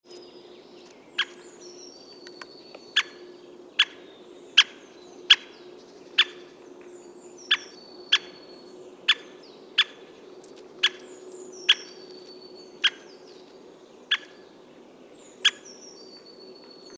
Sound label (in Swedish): Vitryggig hackspett